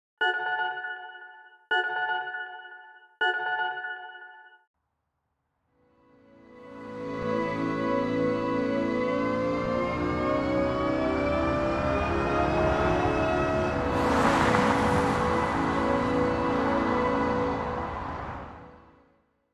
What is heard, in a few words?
Canto Reverse